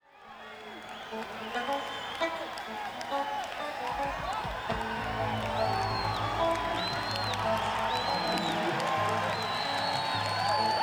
Crowd Tuning

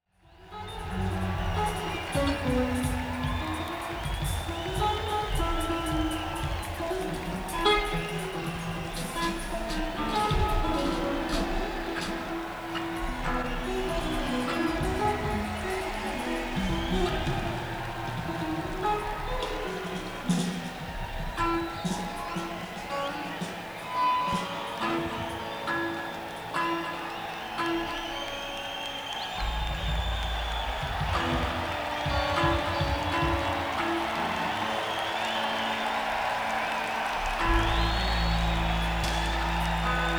Crowd Tuning

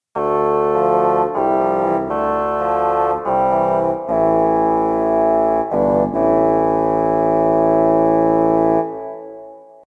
Reedy; Kawai K1; Bank 2 card A

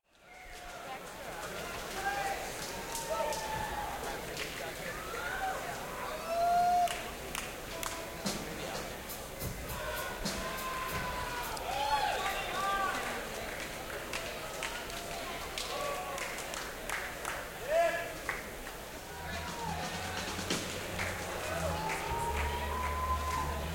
Crowd

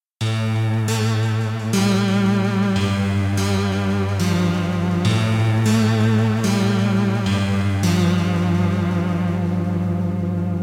6 String; Kawai K1

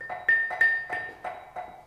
Stones; Cumbria Remixed Sample Pack

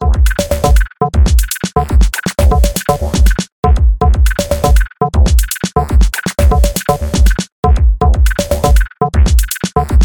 Flange Range

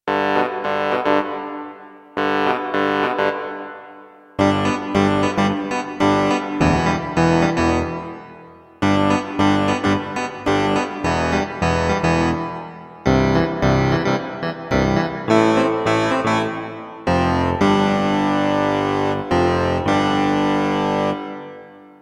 power keys; Kawai K1; Bank 2 card A

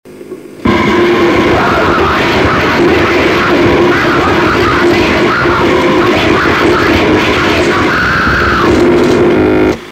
Elvish Song; Bored Of The Conlangs

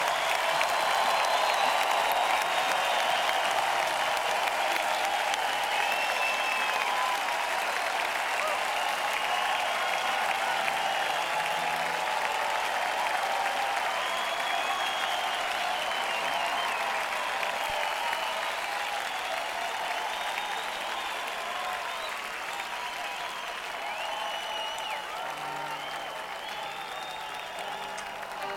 Encore Break

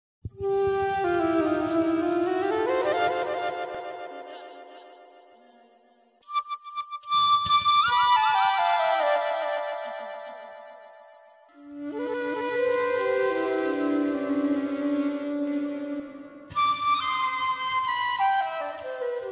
Flute

